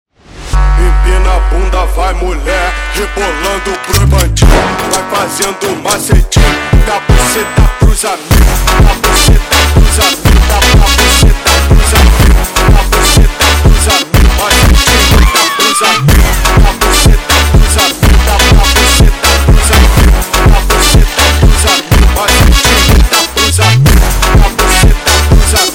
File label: Funk, sped Up